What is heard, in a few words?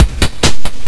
Beat loop, C0; Guitar Rock Tour